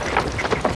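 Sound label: dwarf axe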